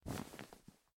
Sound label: Hide Bed; Granny 3; Android